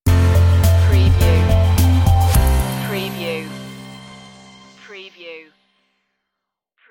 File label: floating stinger